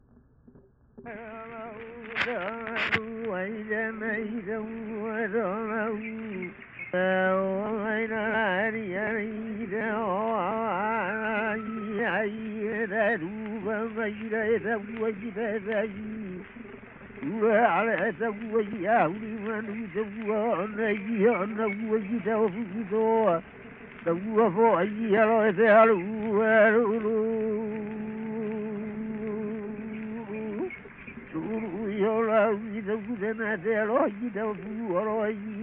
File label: Sacred prayer chant addressed to nature; World Music; Hawaiian; by the goddess Hi'iaka; Na Leo Hawai'i Kahiko - Voices of Old Hawai'i